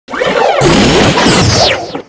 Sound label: ATTACK